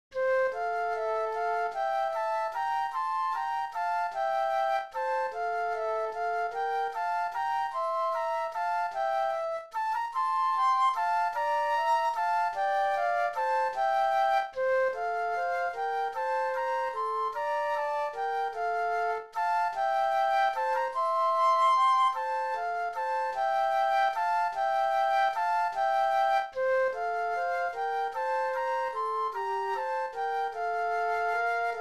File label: Flute Duet; christmas